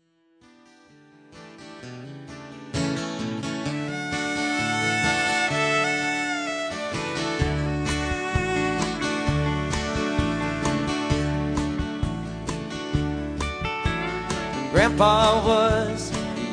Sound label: Violin